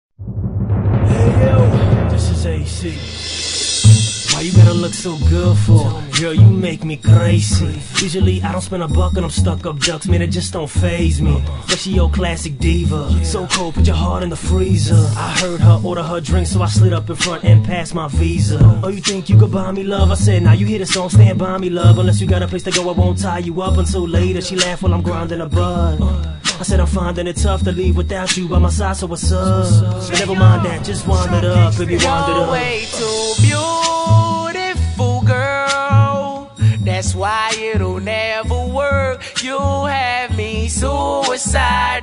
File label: Cha Cha Cha